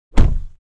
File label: Car door close interior